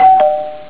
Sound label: extra life; Mrs PacMan